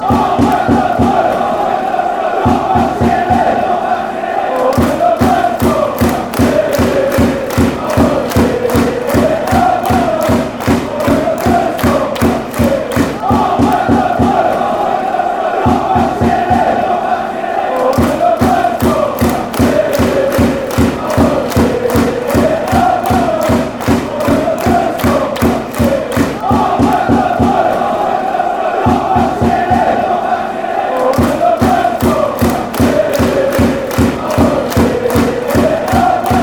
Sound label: when the blues go marching in